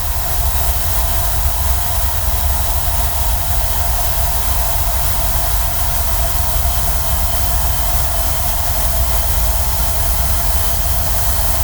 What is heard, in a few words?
warbling